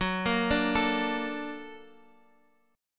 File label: A sharp Augmented Major 7th